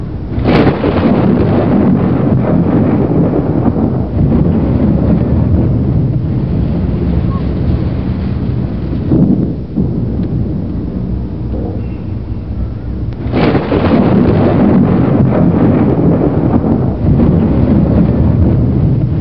weather thunderclaps two

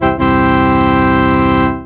instrumental organ Da Da

